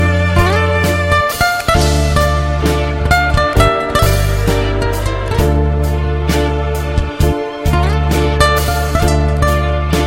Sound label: Guitar